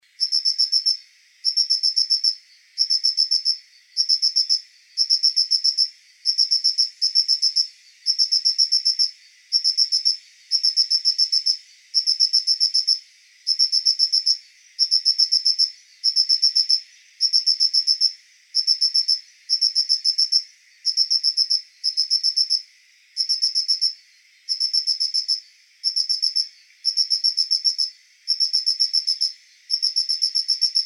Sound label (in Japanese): mitukadokoorogi; insect